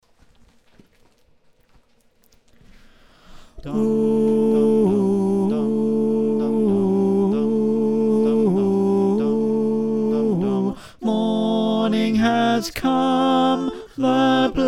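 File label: tenor, choir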